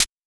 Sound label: HiHat Closed; Drums